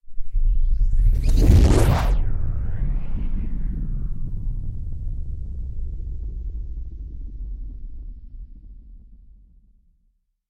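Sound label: meteorite asteroid sounds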